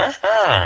complete trade; villager

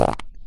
quick n small fart

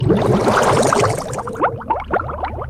bubble transition; spongebob

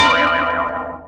bell gong and boi yoi yoi yoi yoi yoing

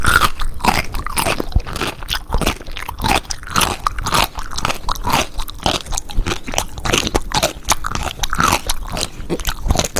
man eating pickle

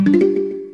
sun pickup; plants vs zombies

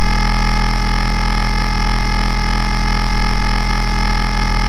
glitch

